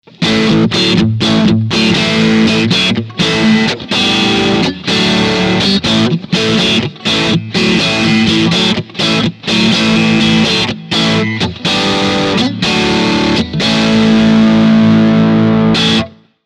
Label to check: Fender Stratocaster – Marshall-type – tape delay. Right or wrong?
Right